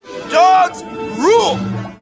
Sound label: dog